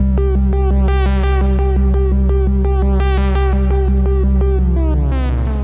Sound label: Drill Drop, 170 bpm